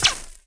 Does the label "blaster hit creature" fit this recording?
Yes